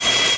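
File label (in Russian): пронзительный скрип дисковой пилы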